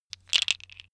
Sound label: tiles wood rattle; objekte; holz